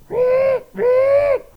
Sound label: freakout